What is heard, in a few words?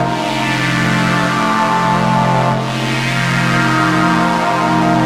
Ober Poly, 95bpm, C, analogue circuit; musicradar